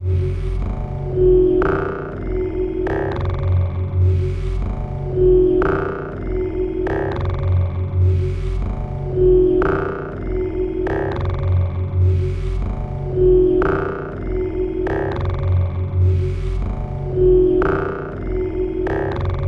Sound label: ambience texture synth; bluezone; sonniss gdc